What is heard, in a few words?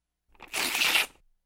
Paper Tear